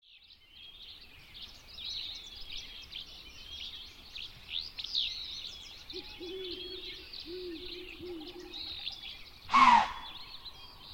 white-tailed deer snorts; redrock lakes national wildlife refuge

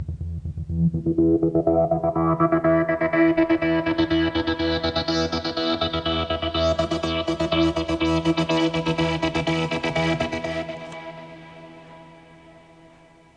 factory sound from new DCAM Synth Squad Fusor; cypher

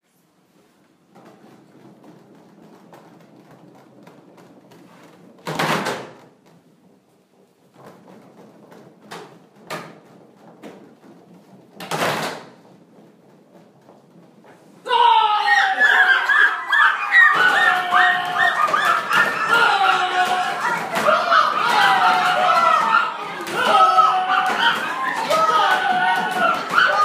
tropical rainforest orchestra

